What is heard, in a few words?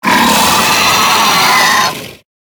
creature snowstalker baby howl